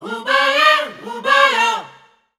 Oo Baye Oobayo Bb 120, Voices Of Africa Sung Phrases